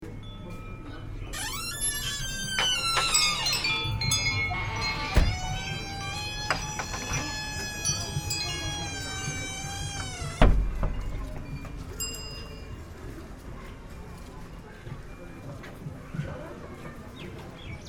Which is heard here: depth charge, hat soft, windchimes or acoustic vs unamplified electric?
windchimes